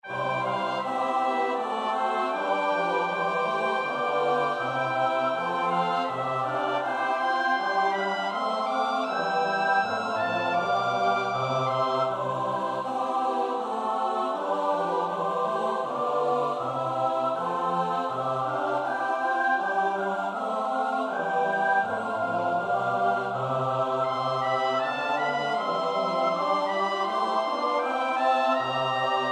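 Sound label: soprano alto tenor bass; Bach (Johann Sebastiaan); BWV 031; 9 So fahr ich hin zu Jesu Christ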